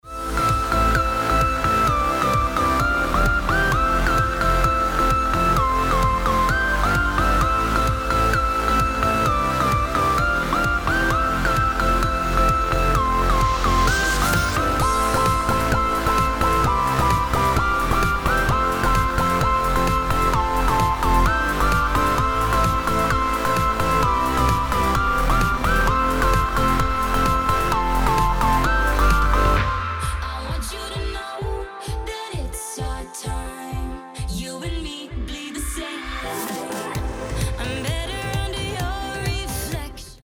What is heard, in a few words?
EDM Before